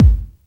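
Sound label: dance bass drum, dance kit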